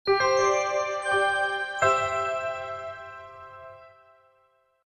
Level Up Success; Legend of Arcadieu Bundle

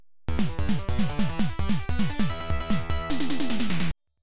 Mission Clear Countdown Bonus; Lights...Camera...Action; Gottlieb; pinball; arcade

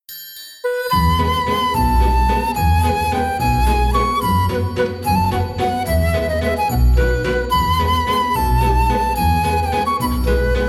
Graceful Dance; Street Fighter 6 Beta

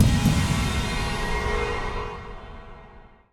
Hi Hit

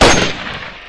Rifle; MilkChoco

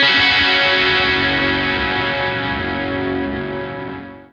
Lake Dirty F sharp; torchlight iii; ps4

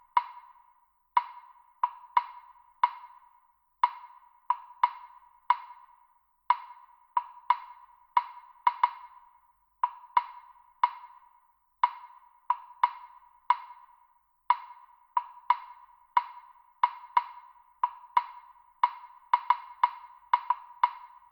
Woodblock; toki tori 2

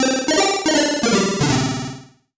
Solo Chip C64 Negative; startide

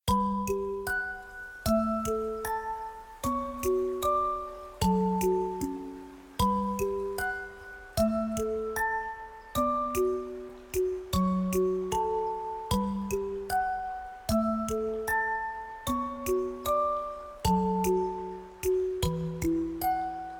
Relaxing Sounds, Forest

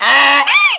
donkey hee haw

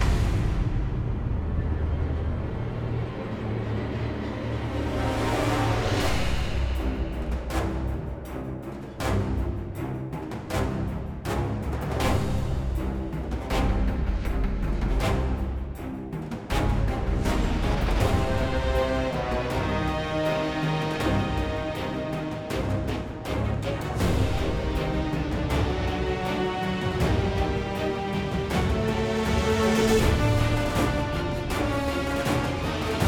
Battle Temple

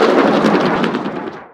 roll, hamster race

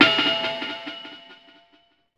Citizens; murasaki baby; ps vita

